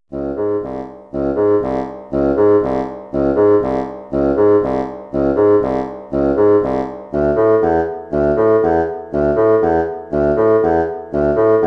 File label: Bassoon; Chorale and Toccata; Basson 2